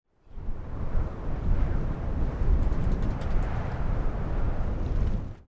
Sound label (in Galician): golpe de vento